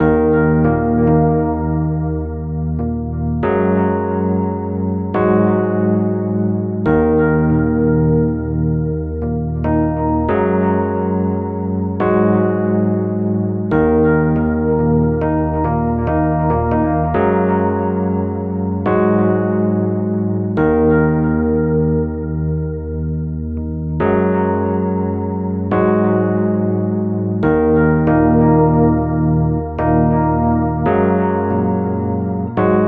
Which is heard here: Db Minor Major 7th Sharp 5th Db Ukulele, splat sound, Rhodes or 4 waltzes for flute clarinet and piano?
Rhodes